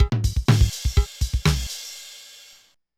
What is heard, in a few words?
Loop, mxr; classic machines